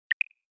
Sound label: Htc Unlock Screen